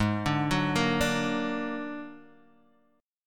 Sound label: Bb Augmented 7th Ab, chord